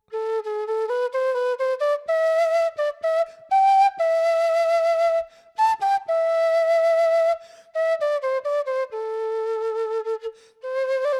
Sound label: quena